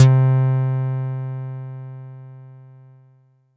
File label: Sy Bass 2 H, Yamaha DTXpress misc voices